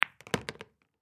Pool Ricochet Ball, Pool Ricochet Ball In Pocket Empty; SPORTS; Blastwave